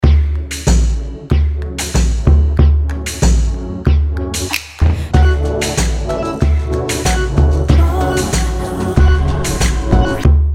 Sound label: modern beats